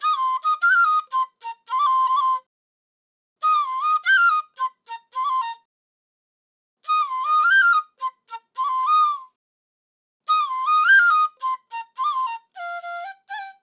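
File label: Hip Hop Flute D major 140 BPM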